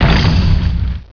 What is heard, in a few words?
DUNK